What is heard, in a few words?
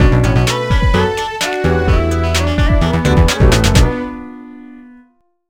Fail Game